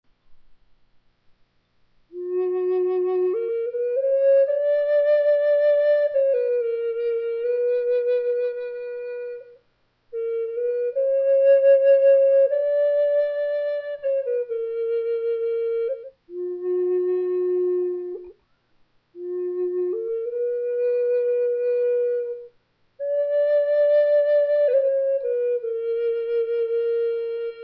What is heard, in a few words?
middle east ren scale, walnut, flutes